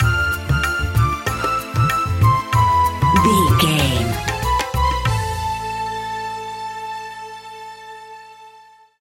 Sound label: sri lanka music stinger, An exotic and colorful piece of World music.; Groovy, powerful, exotic and wild. Non weste